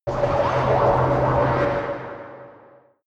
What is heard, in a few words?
space machine alien construction